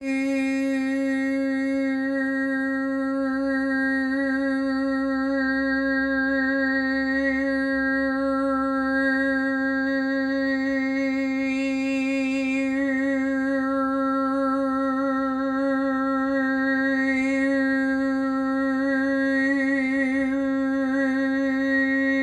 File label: Overtone Singing, women's voices chorus